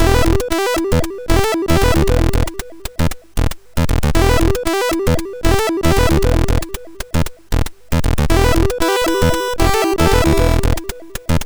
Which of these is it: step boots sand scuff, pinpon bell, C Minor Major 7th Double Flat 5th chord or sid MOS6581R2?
sid MOS6581R2